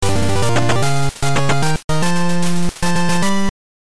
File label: You Win; Bomberman GB